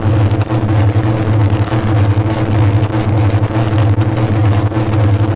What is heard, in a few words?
cairn zap, ambience